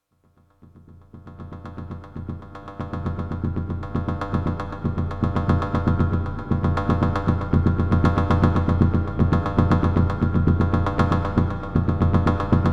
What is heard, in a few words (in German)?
Buchla Soundbeispiel